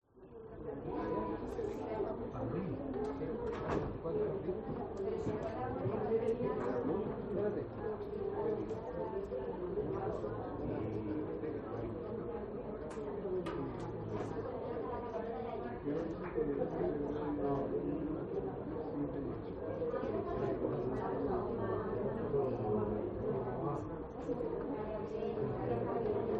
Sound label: SPANISH CROWD Hospital Waiting Room 15 20 People Adults Patients Doctors; Chatting; gain walkers